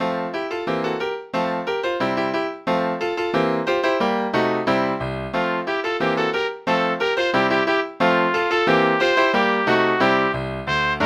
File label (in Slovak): Scherzo pre trúbku a klavír